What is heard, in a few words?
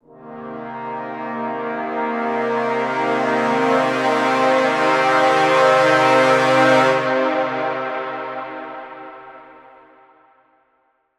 Cinematic Swell - Cm 70Bpm; One-Shots; Musical One Shots; Ghosthack; SkyRealm